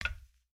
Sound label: Foot Steel Boot Walk, footsteps